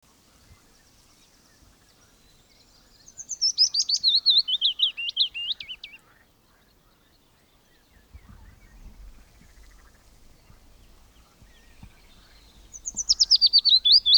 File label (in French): pouillot fitis